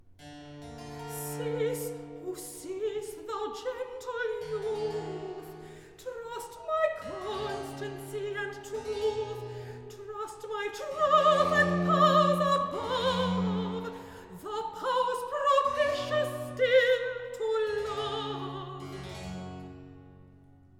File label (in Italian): Recitativo; Cease, o cease; Handel Masterworks